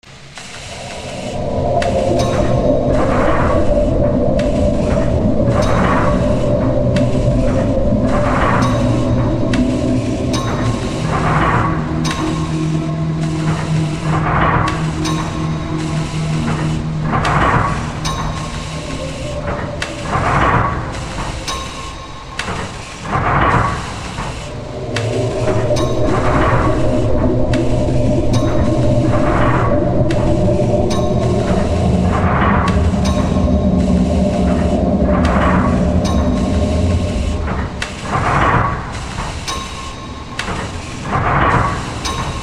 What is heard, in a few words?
suspense; DayZ-Epoch; dayz sfx